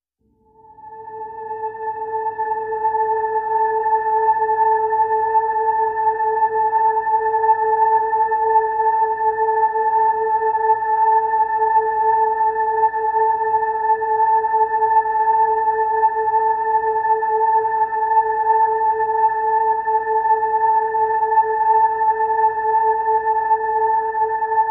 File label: Harmonics A